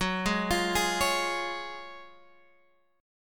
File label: Gb Major 7th Suspended 2nd, Guitar Open G